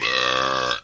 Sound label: burp medium